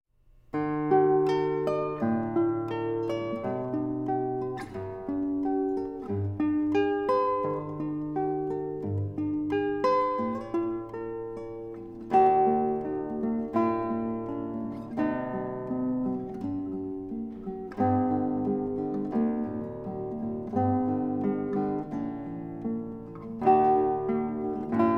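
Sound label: canon in d major arr for guitar; g de chiaro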